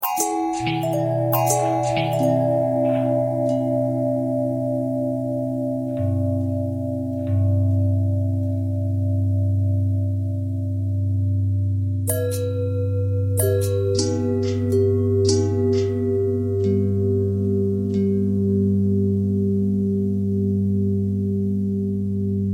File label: long bell thread pentatonic